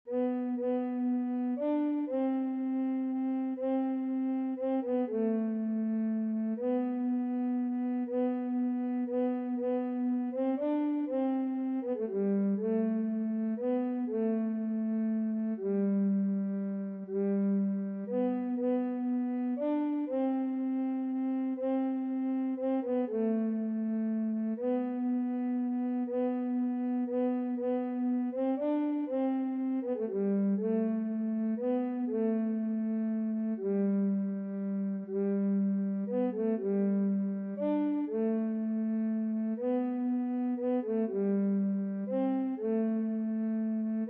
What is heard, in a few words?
Bass Recorder; Earl of Essex Measure